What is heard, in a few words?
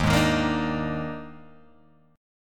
Eb Major 7th Suspended 4th Eb, Guitar, Open G